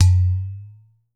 udu, percussion; BRT UDU D; Voice Crystal - Steve Reid Definitive Percussion

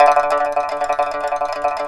PIPA TRL D2; INIS; Opium

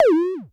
PTCH HIT, Dual Percussion Synthesiser; Klone

